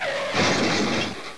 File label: Death, Gerbils; QuickDraw 3D 1.5